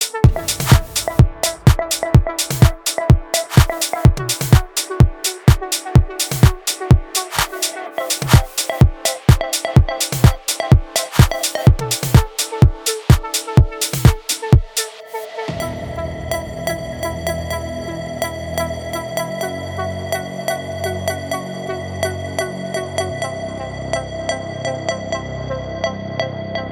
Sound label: Electronic House